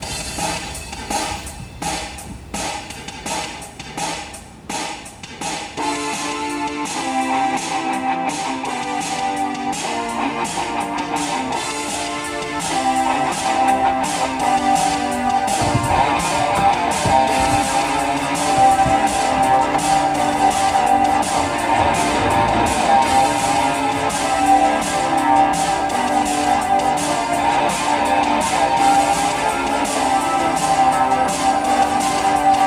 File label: Laptop Music; sons of the forest